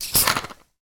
foley fizzy drink bottle cap spin remove air release; sound effects one